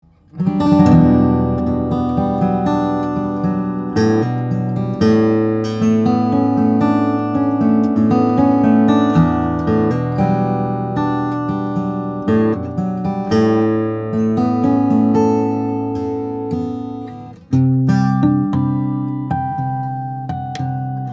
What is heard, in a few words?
steel string flattop guitar; Galloup G.2C; brazilian rosewood; italian spruce